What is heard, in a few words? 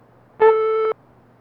Answering Machine Beep